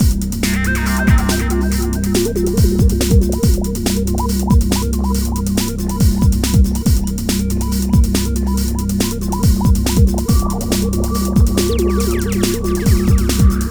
Hardcore Breakbeat, 140 Cmin; Big Fish Audio - Hardcore Breakbeat Action; Hardcore Breakbeat Action CD1; 09 Get Down

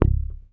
HOFNER MUTE, Bass

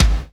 bass drum, Drum & Bass; Ueberschall; Drum & Bass Flash Trackz

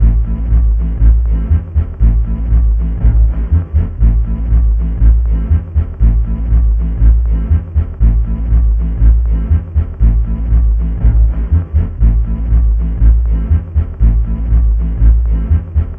Western Shuffle; Sonic Foundry (Sony Creative Software); Club Joints and Street Anthems; Ruff Side

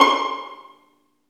String Vlns Pizz

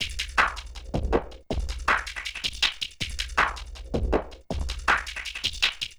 Noise Beat; Drums & Drones

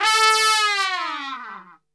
A 2, Brass Super Section, TRP; Best Service; TRP LNGFLL; 90 s Sample CDs

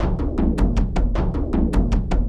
Frame Growing, Drums, Frame, Kendang; Sonic Foundry (Sony Creative Software); Friendly Fire

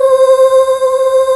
VOX C4; AKAI S-Series CD-ROM Sound Library VOL-2; VOICE

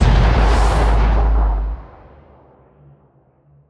Impact; impact samples

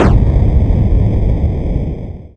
Phase Xplode C2, Club Techno FX; Zero-G

